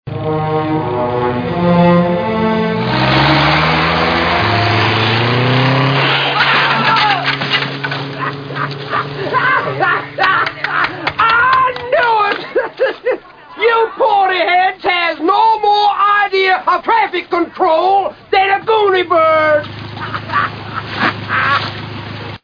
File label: traffic